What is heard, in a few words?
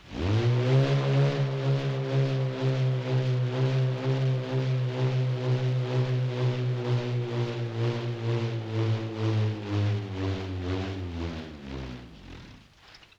LoFi Motor Whir Down; Vintage Cartoon; Foley